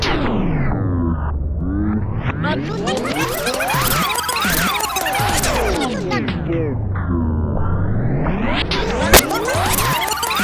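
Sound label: tape rewind fast forward, DJ NOISES; Spectrasonic Distorted Reality 1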